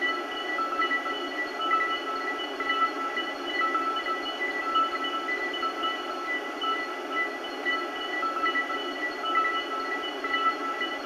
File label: Close Ambient; TimeRift